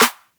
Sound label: Snare; Ableton Live 12 Lite Core Library